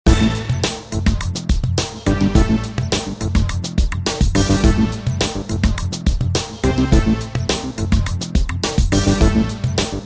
Hip Hop

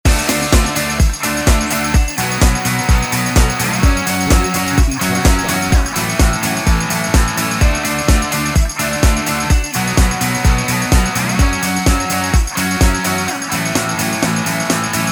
no strings

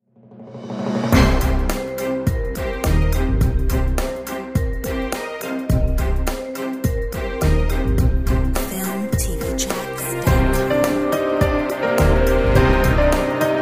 news ticker

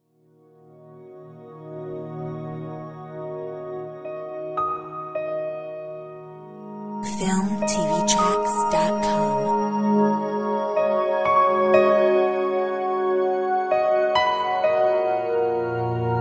Emotional

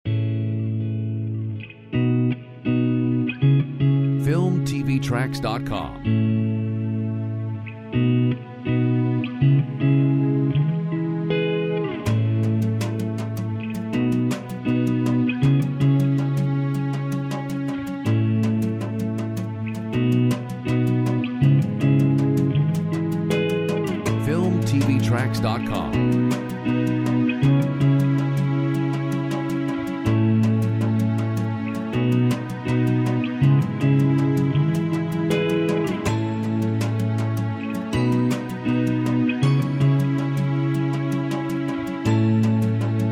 Relaxing

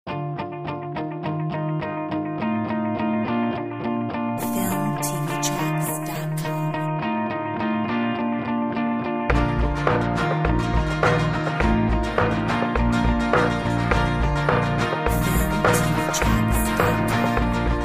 Uplifting story

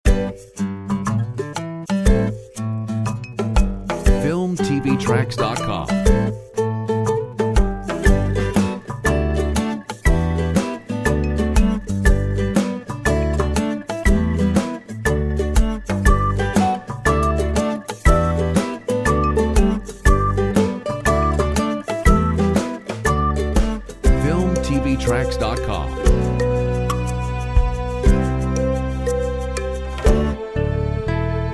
Ukulele happy

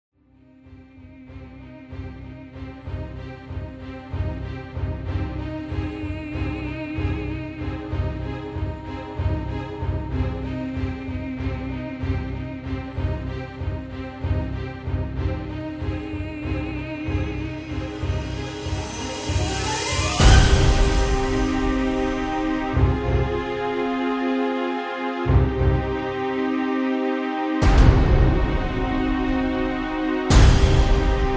trailer

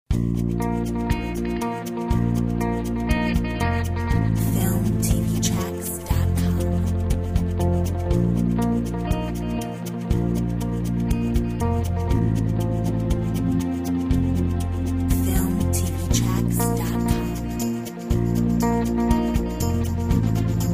Epic Rock

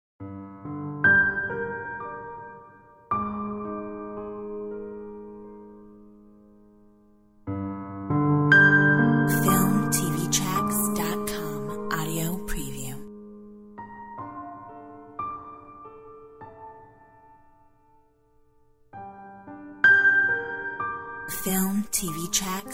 Ambient night

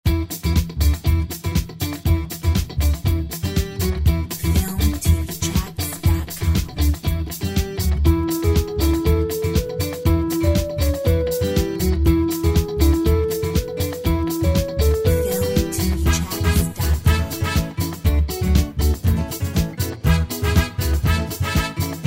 Calypso dance

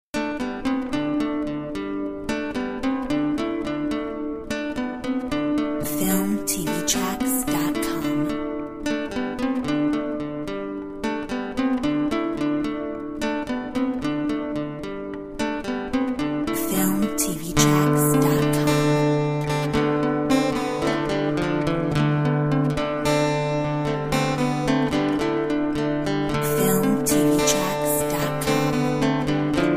Celtic roots

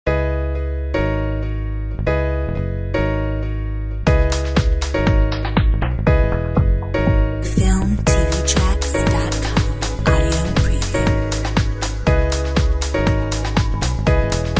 Downtown Jazz

